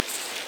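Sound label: echolocation buzz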